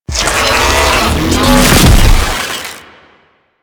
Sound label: Proto Infested Ancient Mid Spin Flesh; Enemies; Abilities; Warframe